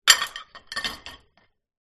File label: household dish place into dishwasher